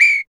EQ Perc, Percussion Mix

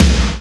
TOM, 90's Kit; Roland L-CD701; Drum Kits 7

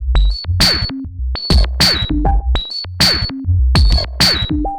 Robo Beat 100BPM, sci-fi Beats

